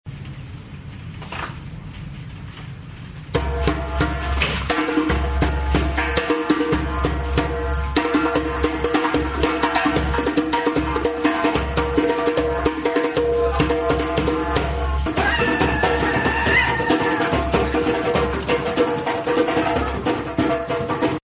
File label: drums; megafon; eto vyzov barabany